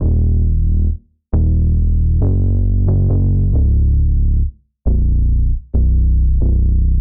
Country

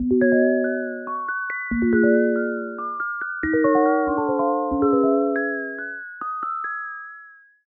Hybrid Advanced Future Bass; midi wizard

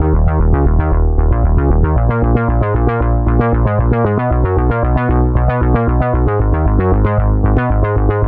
Synthwave Synth Pop

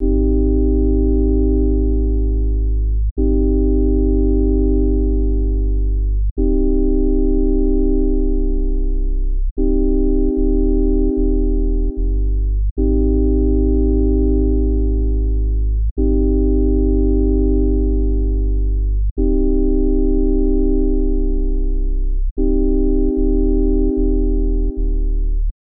Chords Warm Before

